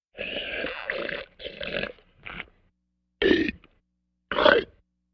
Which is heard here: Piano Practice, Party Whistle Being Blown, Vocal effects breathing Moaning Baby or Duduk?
Vocal effects breathing Moaning Baby